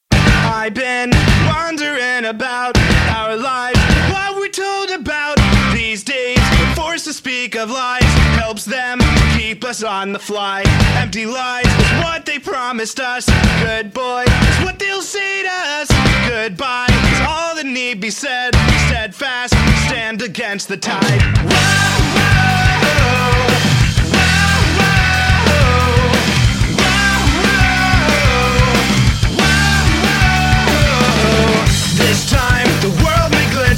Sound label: Bad Blues